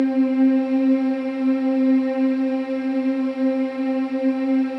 BOYS; Spectrasonics; Symphony of Voices

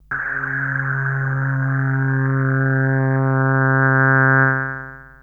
Synth hits and riffs

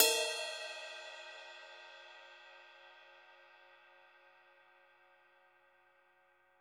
CYM; Bob Clearmountain Drums I

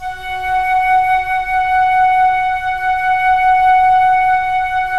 FLT FL, Flute; Roland - LA Composer vol. 4

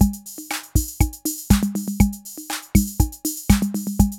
CR-8000 LOOP, DRUM LOOPS

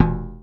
MTL PERC, HIP HOP; Pro-Rec - Synthsonic

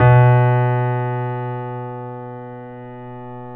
BOSENDORF; E-MU Producer Series Vol. 2 – More Studio Essentials; Keyboards; Bosendorfer

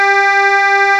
VOCAL WAVE

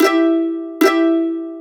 Mandolin; Sonic Foundry; Sony Creative Software; Cinematic Funk Urban Tone Poems

